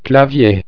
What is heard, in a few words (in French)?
clavier